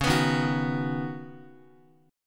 C sharp 7th Suspended 2nd Suspended 4th C sharp down Guitar; strummed